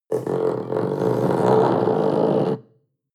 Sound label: panther young grunt deep